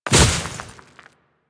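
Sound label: Step Vegetation, Footsteps; Enemies; Warframe; Grineer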